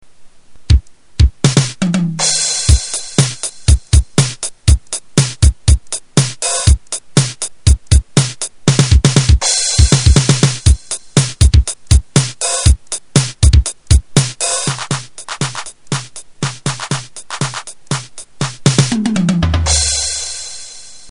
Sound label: drum